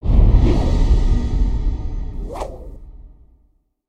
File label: Binocular Path, ambience gnarled forest